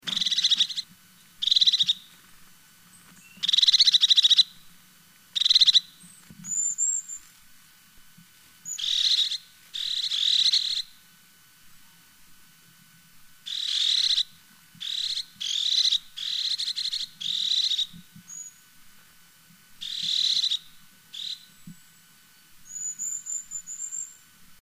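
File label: sinica hohl, crested tit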